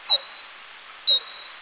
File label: nathusius, bats